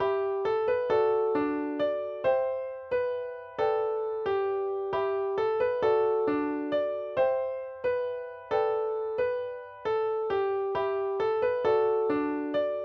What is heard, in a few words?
instrumental